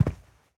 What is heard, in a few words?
Footsteps Sneaker Carpet Walk